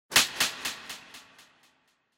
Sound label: Hit Slap Delay